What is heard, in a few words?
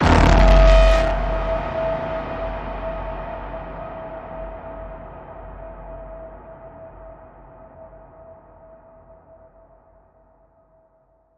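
Blast Alert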